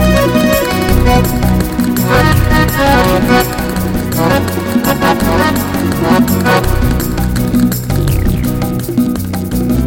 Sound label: Great Flamenco